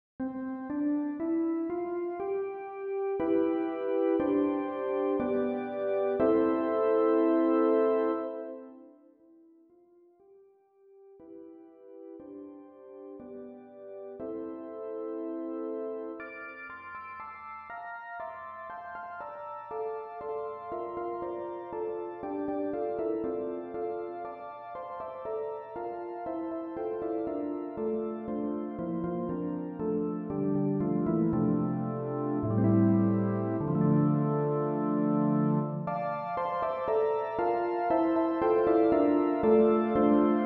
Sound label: Basic Digital Menue Pad; VE PRO; XPand!2